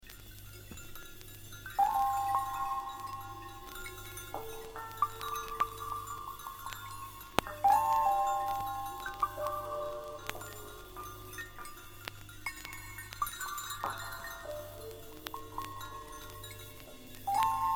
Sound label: bells breakdown